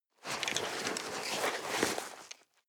nylon leather medium clack

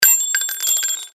bullet shell drop

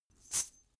chain mail march single right

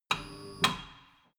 tire changing machine turning platform short

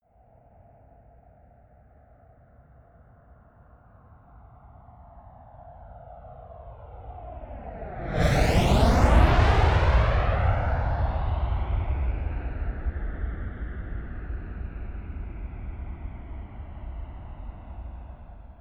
military jet flanker-c turbine flyby fast close

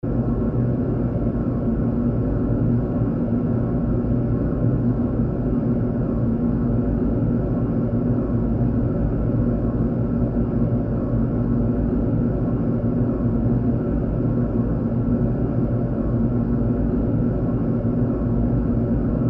deep noisy drone

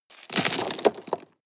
drop rock debris hard short

